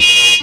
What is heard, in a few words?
alarm, FX & ATMO, ALARMS & ALERTS; Techno Trance; Techno Trance Essentials